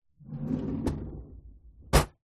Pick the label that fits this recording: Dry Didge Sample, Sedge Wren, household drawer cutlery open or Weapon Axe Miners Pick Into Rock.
household drawer cutlery open